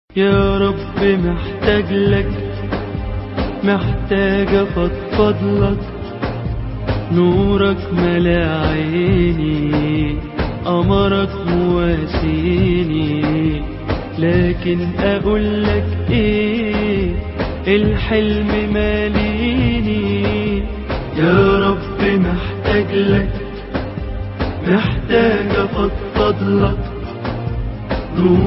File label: islamic arabic song; Islamic Arabic Song Ringtone; imbued with Islamic culture